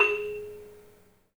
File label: MARIMBA; 3D Sound; 3D Keys VOL-1